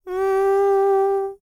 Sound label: HUMAN TRPT, Jazz FX; Spectrasonics; Vocal Planet